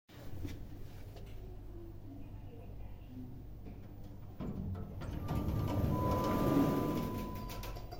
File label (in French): assenceur